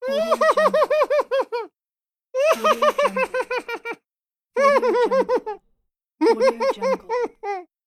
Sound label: the cartoon laugh